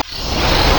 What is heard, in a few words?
ramp; LoL; xerath base